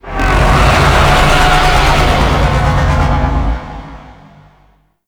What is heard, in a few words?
Void Dragon Vocal Death; Enemies; Vocals; Warframe; Duviri